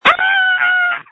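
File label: Scream, phone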